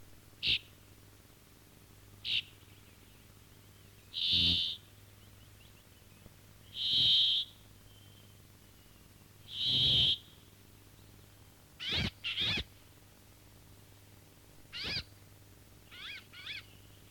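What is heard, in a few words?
finch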